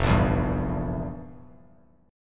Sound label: F Major 7th Suspended 2nd Suspended 4th, F, down, guitar; FM7sus2sus4 strummed; JGuitar